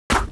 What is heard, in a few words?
body, fleshhit